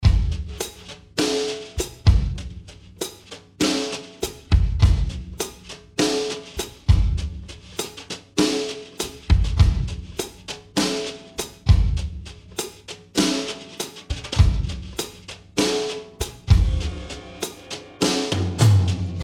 100 Drum Bus

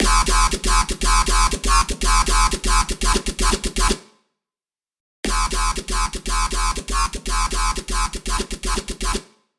Bass Side Peak

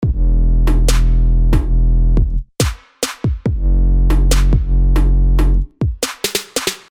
140 E Full Drum Loop

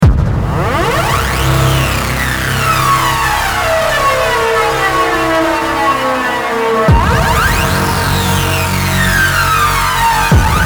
Massive Dubstep; Patch Showcase

